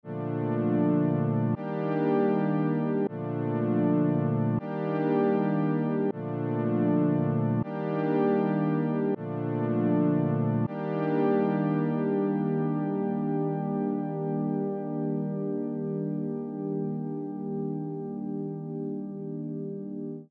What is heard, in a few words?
String Loop Dmaj 158 BPM